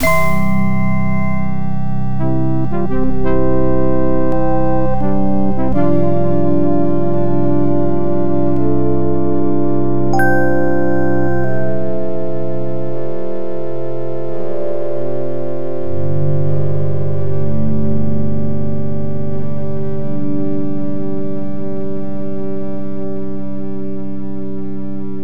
Balloon Ready

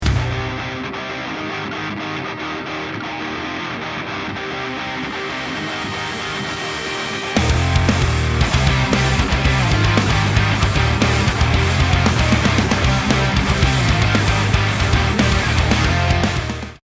round ten sec count

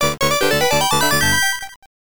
Won Medals; shuriken boy arcade gamerip 1993

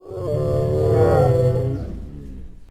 sound Dragon Boss Defeat

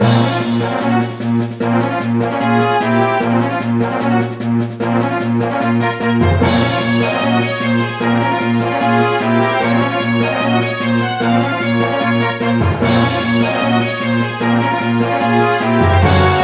Battle; Machine Knight RPG; android game music

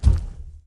Giant Stomp; Lethal Company

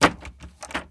Locked Door

